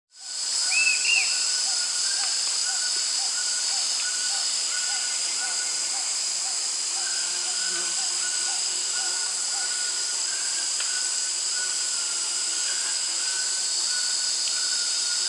Yellow-crowned Tyrannulet (Tyrannulus elatus), Peru; S. America